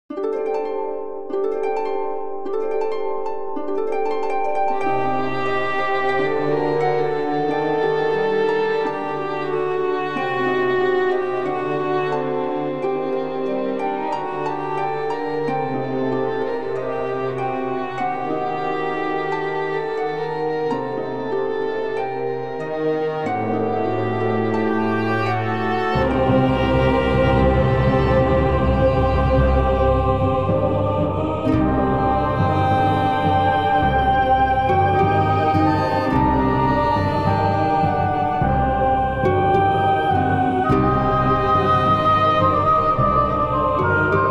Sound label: Prelude